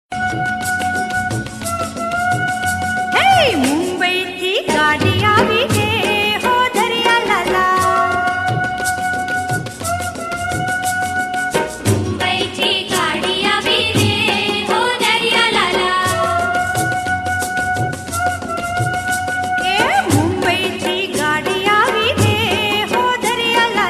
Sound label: Garba; Mumbai